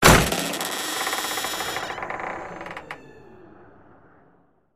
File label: Door Wood Open Garden Secret